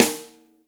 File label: short reverb snare a sharp key